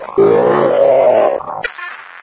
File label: zombine alert; npc; source engine; episodic